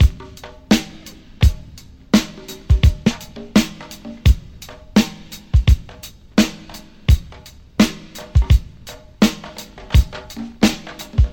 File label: 85 bpm hq drum loop c sharp key, Free drum loop - kick tuned to the C# note. Loudest frequency: 1119Hz